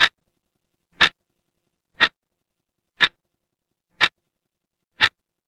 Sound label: mechanical clock tick